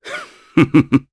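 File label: Laugh, Japanese; voices; heroes; Nicx; kingsraid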